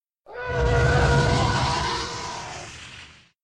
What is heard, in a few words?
TIE Fighter; star wars; dark vador